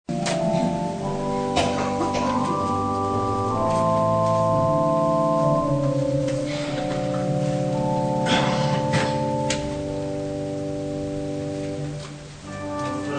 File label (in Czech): varhany; strycice